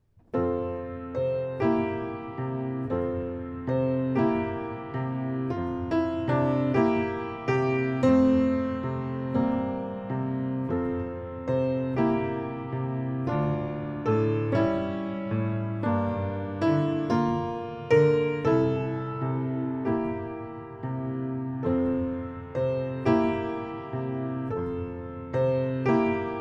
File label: Lullaby